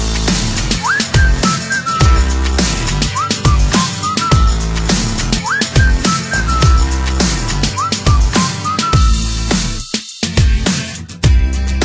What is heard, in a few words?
Whistle Instrumental